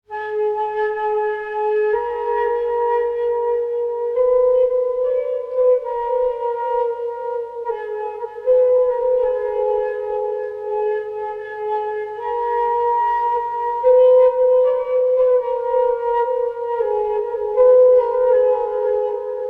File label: Flute Music